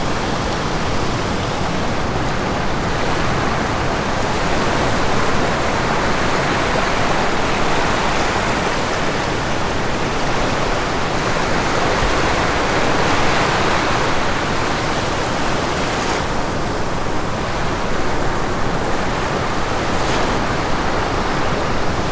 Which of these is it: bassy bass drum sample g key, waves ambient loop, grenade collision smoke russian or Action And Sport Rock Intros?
waves ambient loop